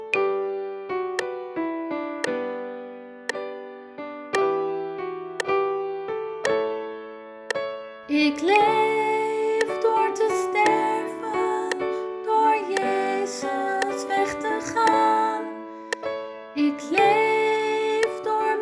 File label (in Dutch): Click Track Sopraan partij; voor het laatste refrein